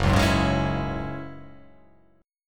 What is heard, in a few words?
F sharp 7th Suspended 2nd, down